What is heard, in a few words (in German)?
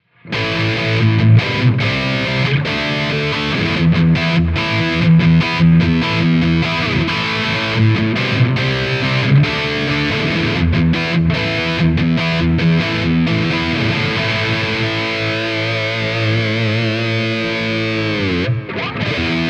Highgain Rhythm, Gitarren; Gitarren Plug-ins im Vergleich Amplitube 5